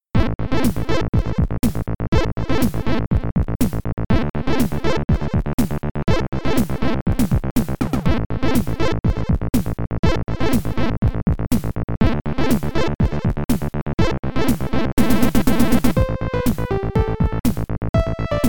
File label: music adlib